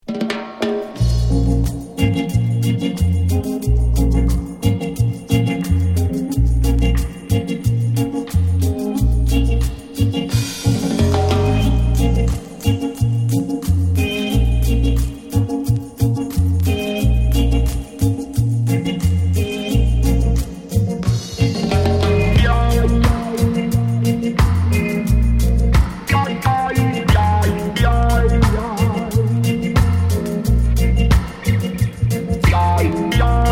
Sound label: Chant Dub; Stones Throw